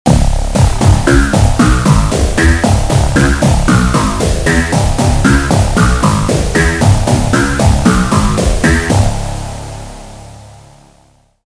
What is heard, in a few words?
bass; opxpro